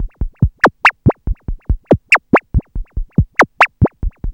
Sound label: MACHINE BEAT; Zero-G Ambient 1